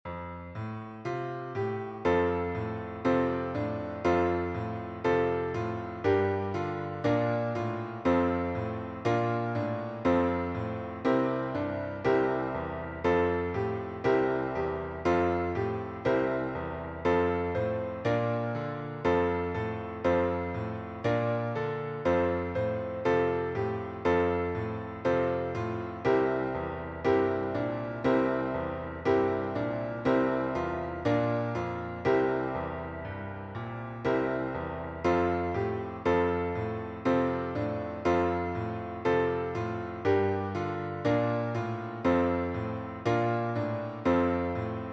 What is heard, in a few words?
hoc kinh thanh midi